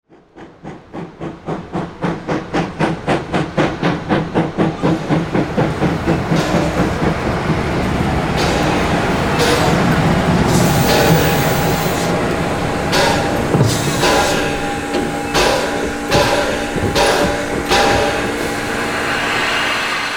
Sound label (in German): dampfzug faehrt ein; waveland tonstudio